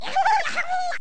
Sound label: Angry Birds Bird